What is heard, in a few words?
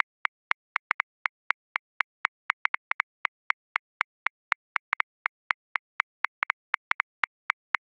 Swing Hip Hop, Grooves; Drum Booth; Ableton